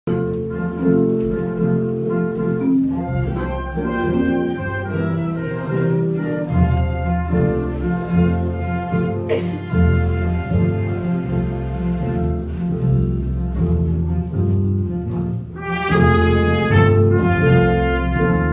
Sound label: Morning Blues; recital poetico musical dando voz a las mujeres